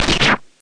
powerful energy gun

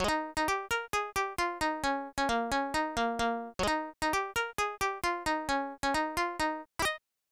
Harp; 1993 snes; Asterix

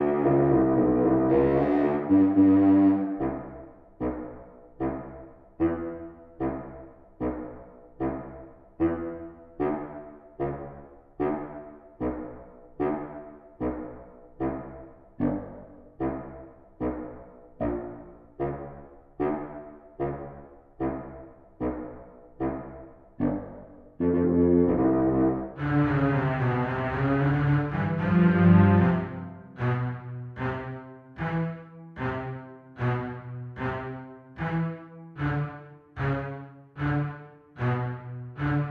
Underwater; Super Mario Bros.

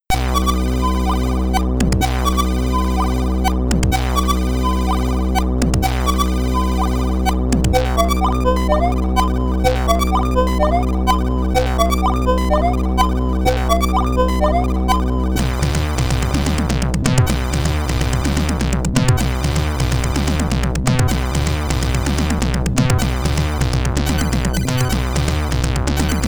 BGM D, SID Stereo; brain artifice; commodore 64

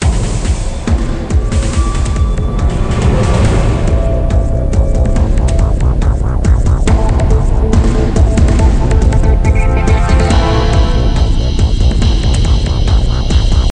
Music Action; Emergency Kids; nds; Music Action 03

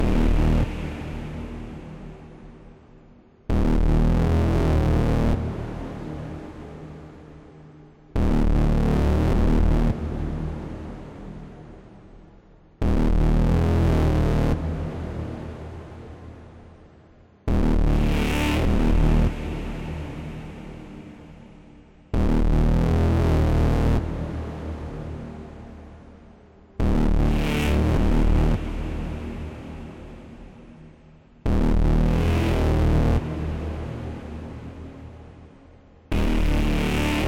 Storm Bass; The Solus Project gamerip 2016

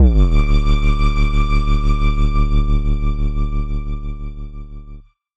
Low Health Stinger; Black Future '88

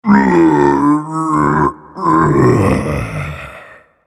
Vocal Colossus Pushing Blocks

